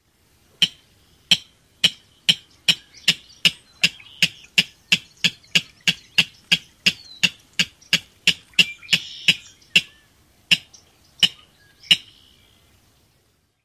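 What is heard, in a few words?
clucking calls, king rail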